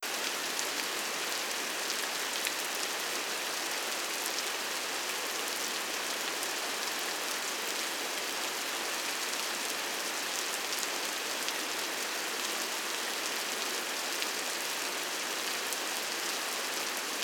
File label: rain continues